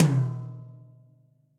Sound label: Tom Mid Big Punchy; Drum Hits; Ableton; Factory Packs; Session Drums Club; MPE Hits